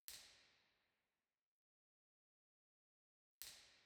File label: Musikverein, Theatres